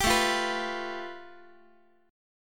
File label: A 7th Suspended 2nd Sharp 5th, Guitar; A7sus2#5 strummed; JGuitar